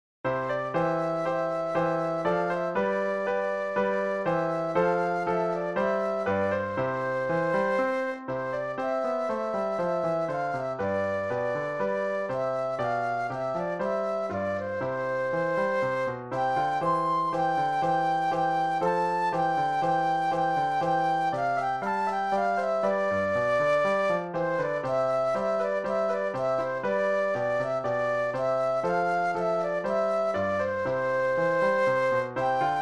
March Flute Solo; classical; Bach; BWV 212

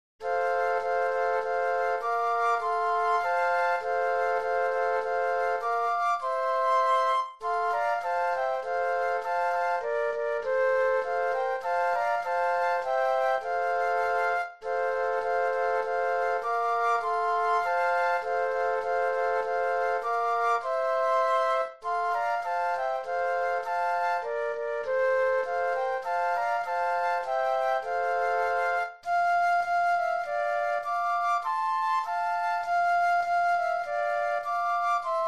The Holly and the Ivy Flute Trio; christmas

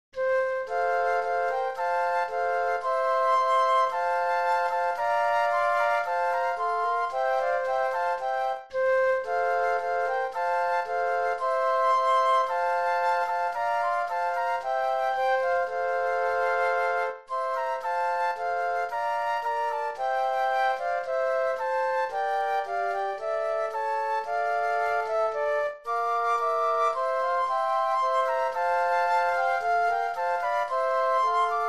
Prelude Flute Trio; classical; Charpentier

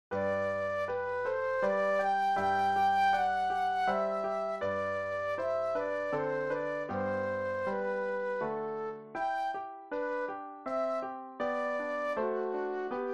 Minuet Flute Solo; classical; Gluck; Orpheus

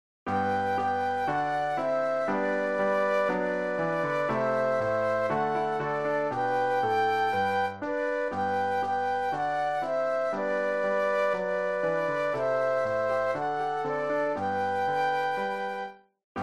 Rigaudon Flute Duet and Piano; classical; Purcell; Z. 653

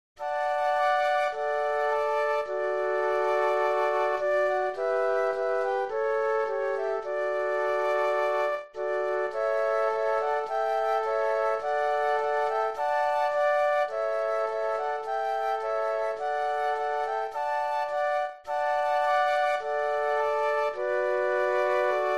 Still Still Still Flute Trio; christmas

